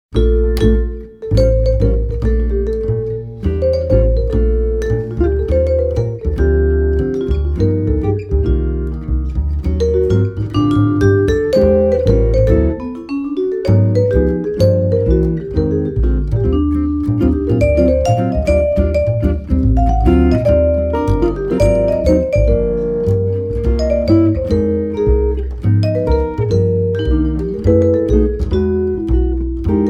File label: Snow Samba